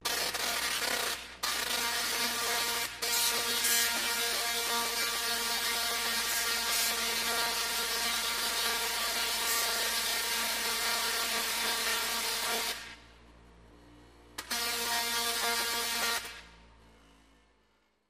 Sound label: Zaps Electric